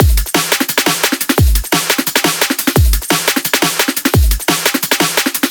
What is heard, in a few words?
Drum Breakbeat with Kick Snare Tops And Percussion, drum and bass